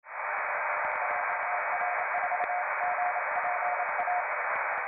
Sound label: YAESU 500Hz; Japan